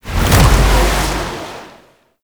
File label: Void Wind Whoosh; Vazarin; Focus; Ultimate Abilities